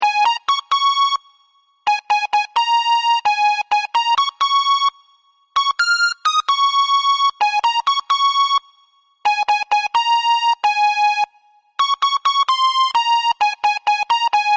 ringtone

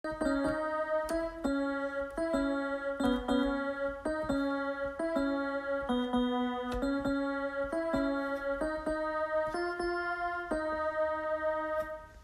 Loop

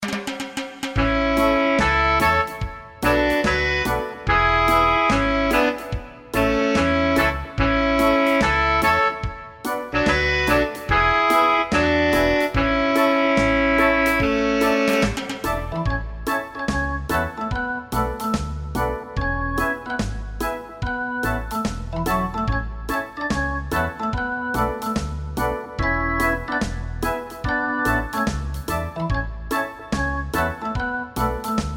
Reggae

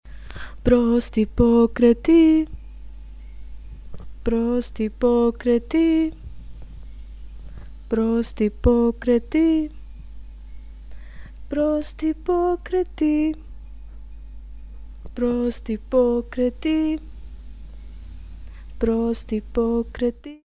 zebu croatian

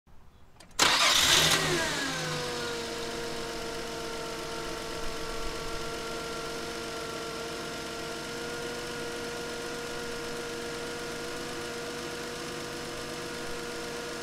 Car Idling Sound Effect; Traffic Light

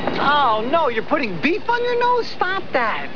sniff

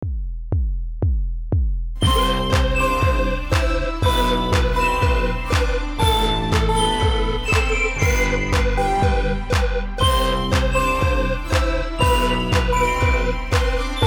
BELLS

